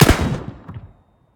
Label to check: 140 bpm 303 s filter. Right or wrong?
Wrong